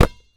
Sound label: Street Ball Rebound Grab; SFX Kit